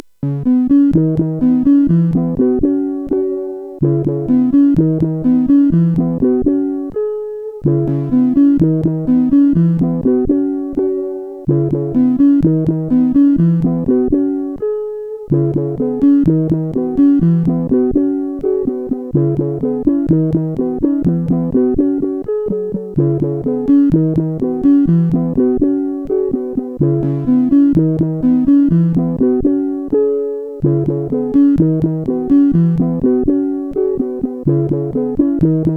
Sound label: Piano, sid CSG8580R5; hvsc